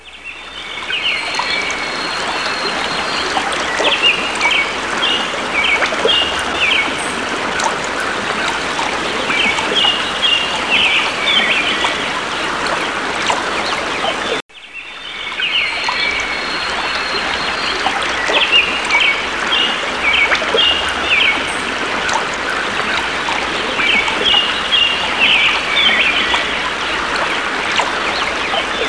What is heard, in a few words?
Brooks birds; SuperModels in the Rainforest